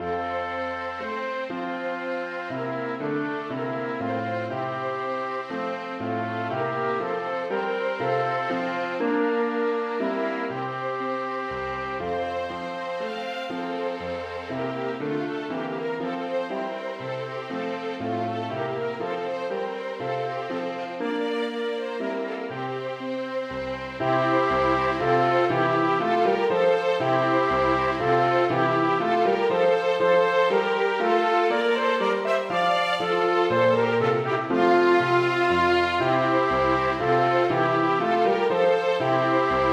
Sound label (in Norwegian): Menuet for trompet, to fioliner og piano